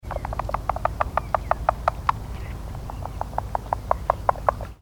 pretiosa, amphibians anura

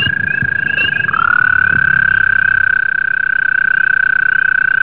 americanus, amphibians anura

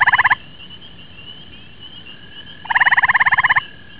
versicolor, amphibians anura

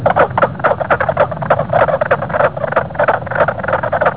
septentrionalis, amphibians anura

